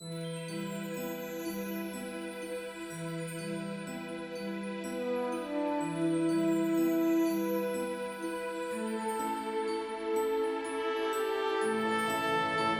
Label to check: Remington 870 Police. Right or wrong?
Wrong